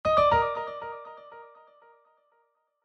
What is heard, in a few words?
multimedia alert tone piano elec piano